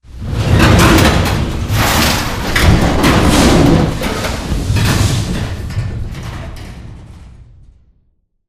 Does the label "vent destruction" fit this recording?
Yes